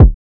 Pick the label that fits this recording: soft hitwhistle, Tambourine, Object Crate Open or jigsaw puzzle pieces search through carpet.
soft hitwhistle